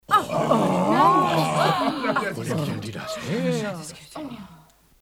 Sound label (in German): Erstaunen Menschen